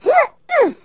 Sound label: CLIMB; Messiah